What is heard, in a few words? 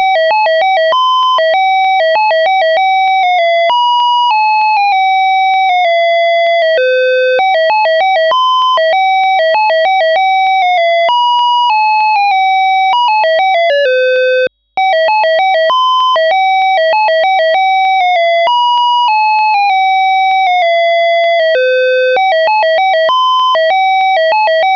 mazurka